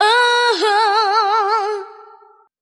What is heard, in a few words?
"uh huh" SUNG